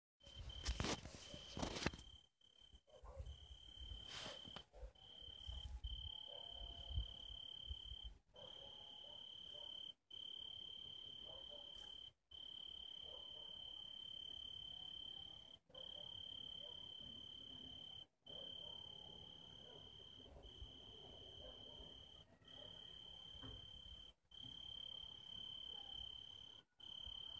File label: Oecanthus pellucens; Radzikowski Pawel